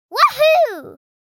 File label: Woo hoo cheering girl child kid